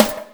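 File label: rimshot fx; Breaks N Beats Sound Kit; Breakz Kit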